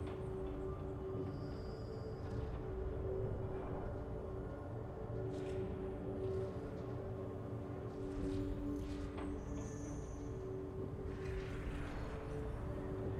sfx amb hexgate

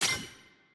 tutorial next

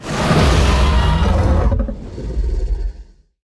roar; shyvana

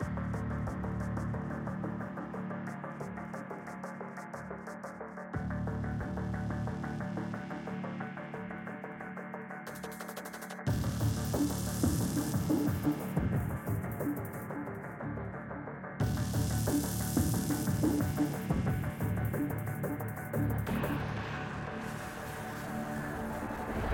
bkgd noise theme

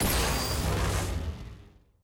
skill hit; jhin skill 1

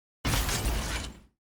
tier wings promotion from platinum; ranked

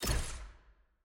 ui room select enter

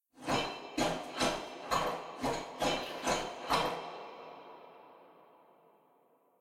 walk workout jumping jacks phase

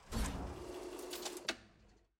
click; caitlyn record collection return

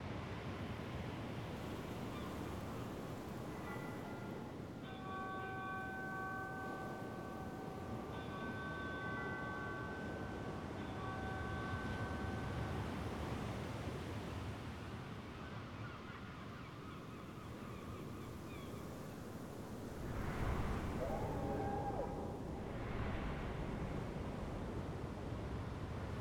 dragon amb lagoon; tft

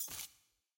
ui button cancel click; tft; dragon

